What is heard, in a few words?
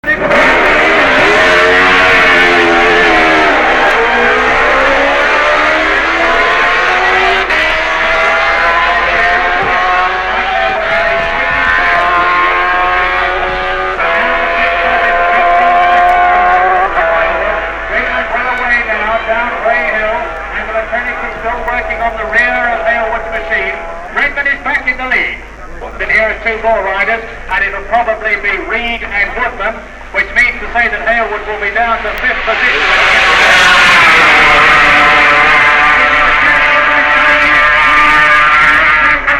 Pit Stop; 1965; Hailwood Redman